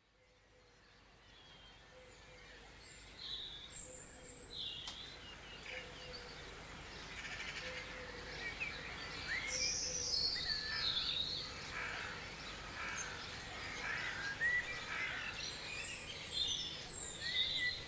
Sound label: Dawn Chorus